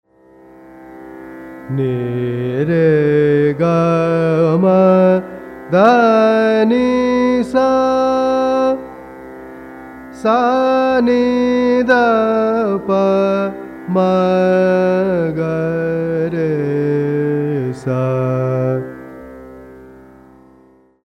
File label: raga Yaman